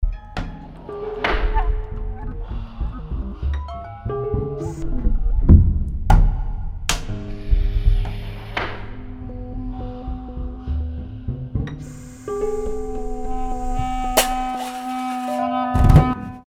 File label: random tutti rough mix